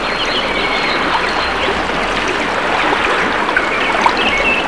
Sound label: river